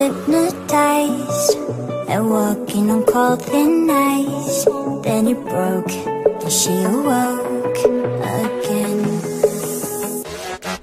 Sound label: cartoon ringtone